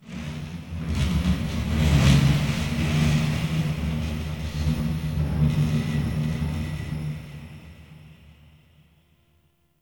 Metal Movement Texture Large, Corpus Ship ambience; Textures